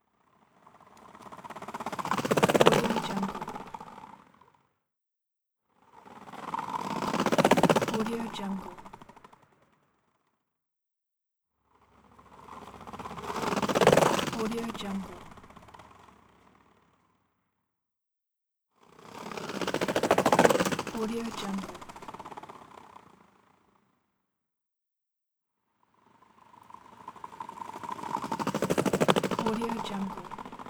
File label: skateboard passbys